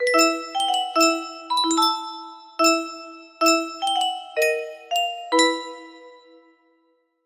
music box

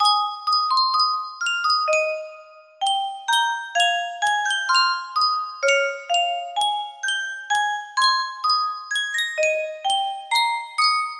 yunsheng custom tune music box

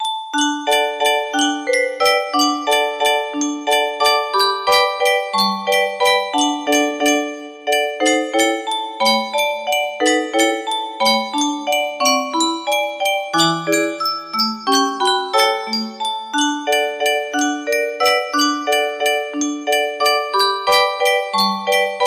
refrain, music box

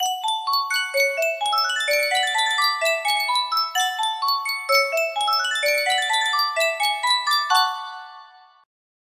Sankyo miniature music box

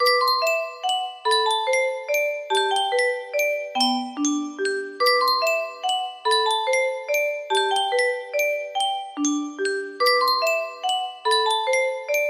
Yunsheng custom tune music box nokia ring

